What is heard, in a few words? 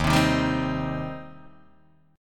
D sharp 6th, D sharp, down, Guitar, Open D